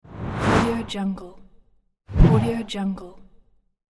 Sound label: fast swoosh; sound forces